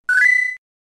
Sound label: sound effects save and load; final fantasy vii